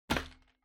Drawing Machine Garapon Put

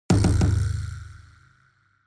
Onoma Impact

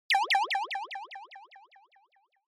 Anime Motion Low Delay

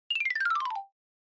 Xylophone Down Long

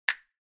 Finger Snap Foley, Dry

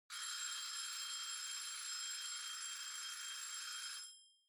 Kitchen Timer Far Alarm Long

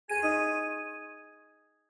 OS Sound Notification

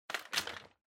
Vacuum Cleaner Pipe Remove; 掃除機; パイプ 外す

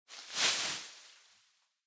Throw Streamers Noise

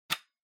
PC Hardware USB Unplug

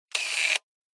Camera Lens

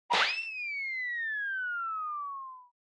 Motion Blow Away Long

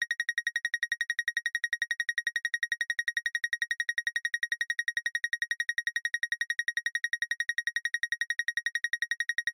Motion Tremble Fast Loop

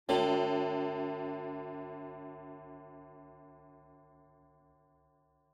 Pendulum Clock Chime Denoise Short

